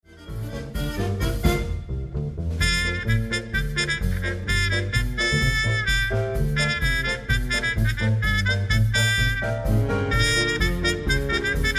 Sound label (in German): wahwah mute mit